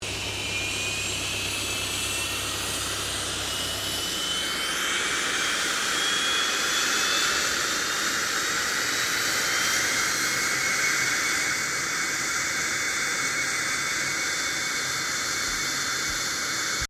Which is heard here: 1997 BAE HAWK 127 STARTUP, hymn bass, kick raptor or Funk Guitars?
1997 BAE HAWK 127 STARTUP